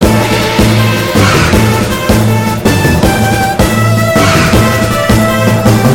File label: Peach Transform Bgm Melody